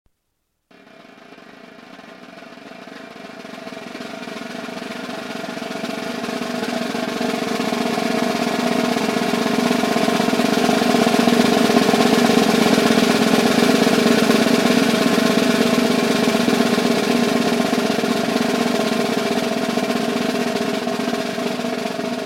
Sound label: buzz roll